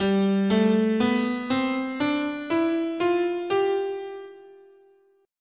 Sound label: G Mixolydian, scale